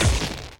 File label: spark; Mindustry